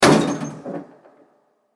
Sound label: Hotel Elevator Lift Door Closes